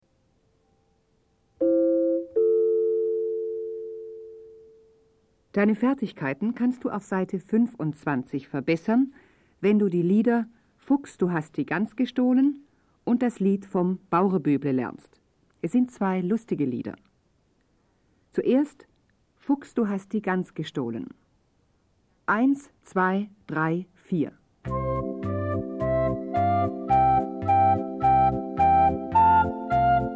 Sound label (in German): Spiel Blockfloete